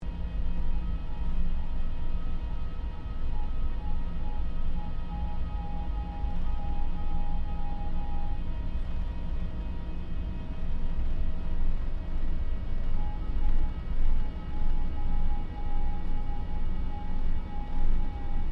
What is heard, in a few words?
AIRCRAFT LOCKHEED L-1049 SUPER CONSELLATION TAXI INTERIOR; Connie, Super Constellation; 1955; Airplanes